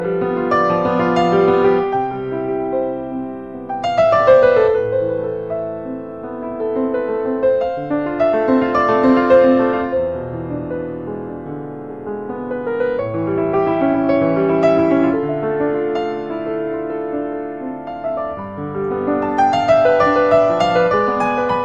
Piano Classica Rain

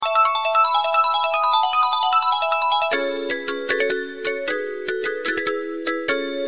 tono dei carillon

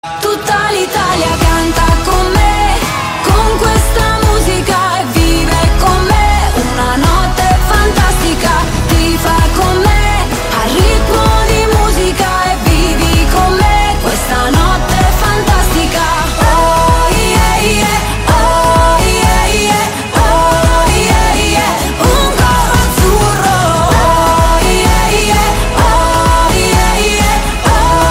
Coro

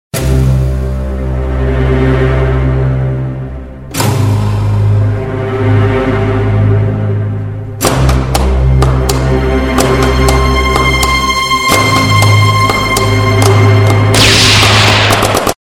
Fantasma Nella Casa; suonerie

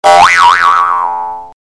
Boing Divertente

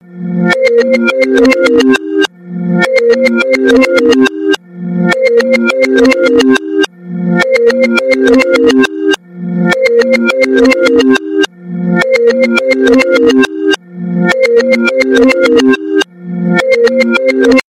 Sveglia iPhone

